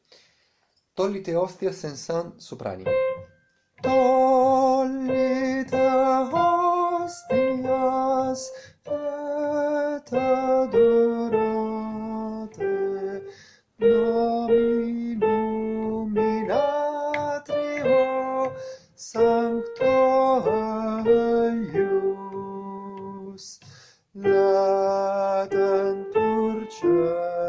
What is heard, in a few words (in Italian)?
soprani; Tollite Hostias